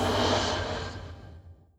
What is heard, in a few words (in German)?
kampf lichtschaden; magic 2014